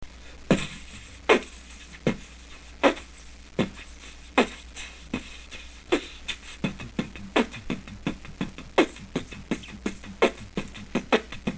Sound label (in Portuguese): solo bateria